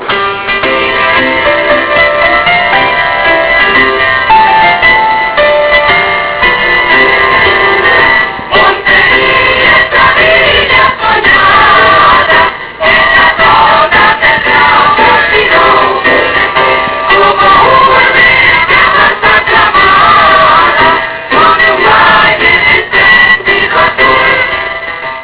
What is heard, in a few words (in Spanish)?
monteria himno